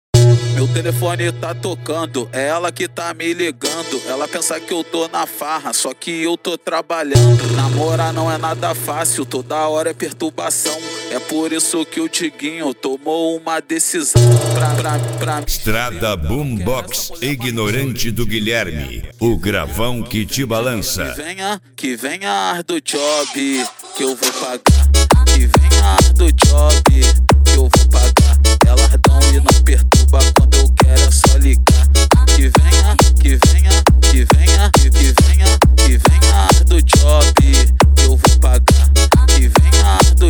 esp funk atack

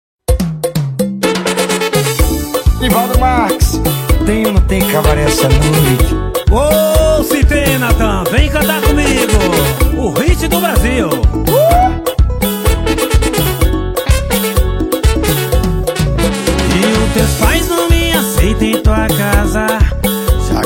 sertanejo

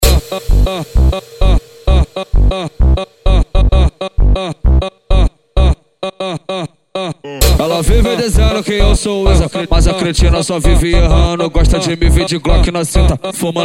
funk agressivo funk

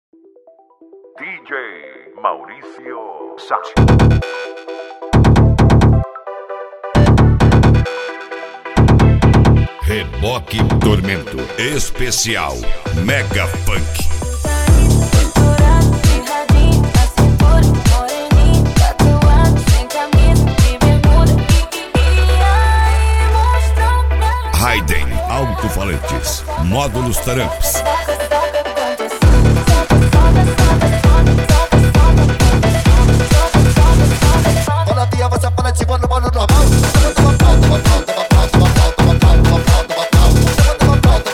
mega funk

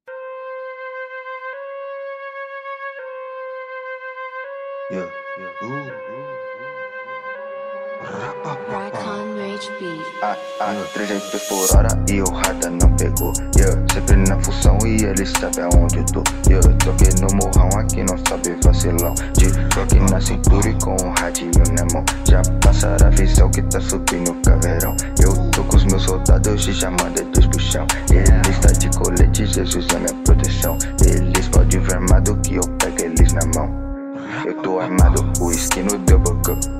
trap graves bass

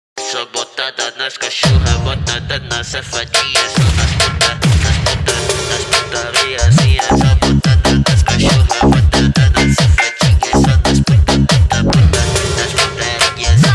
pancada; equipe power bass

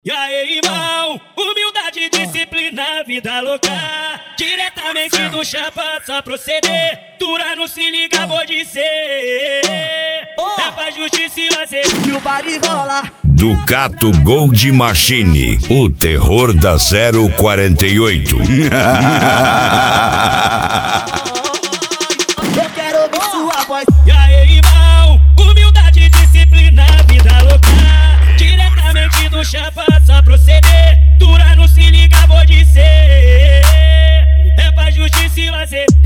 funk agressivo do sul

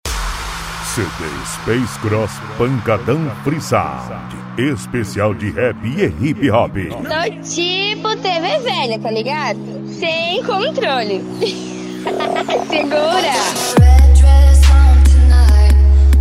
pancadao rap e hip hop

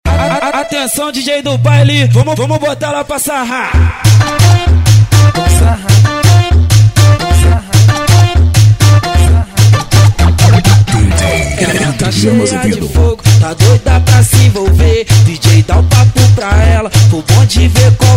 funk bass o poder do grave

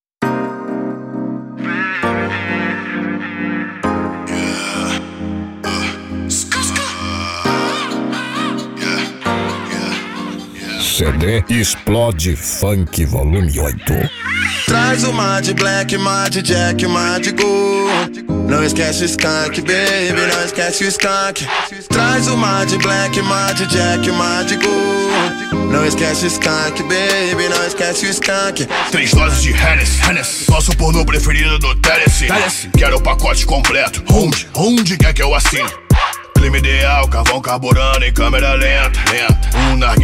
explode funk

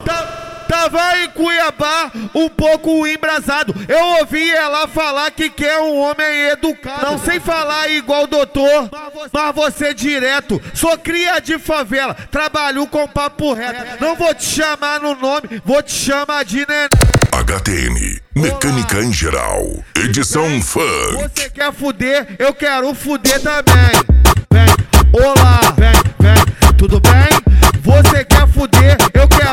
mecanica em geral edicao funk

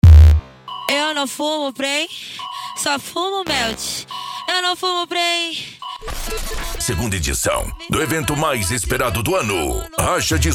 racha de som automotivo e som musical

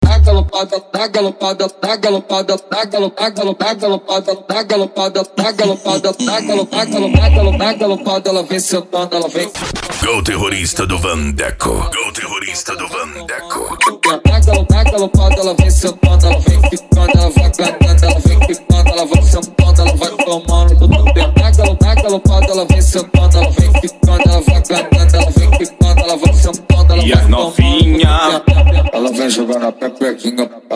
dance e funk mega bass

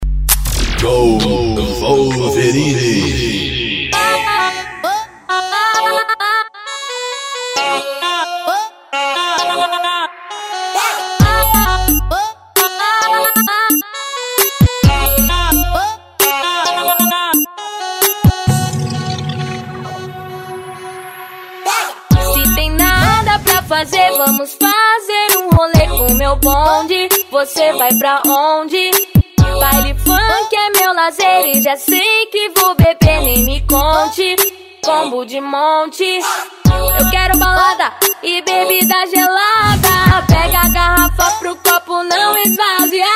especial funk

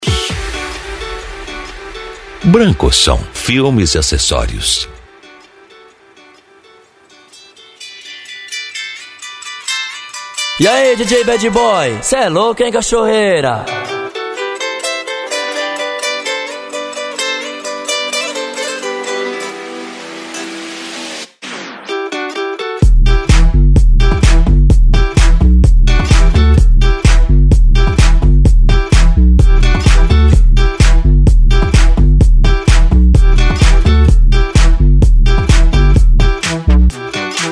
dance e funk retro; branco som

